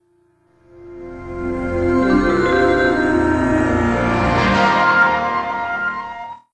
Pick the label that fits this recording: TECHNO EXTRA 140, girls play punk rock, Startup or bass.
Startup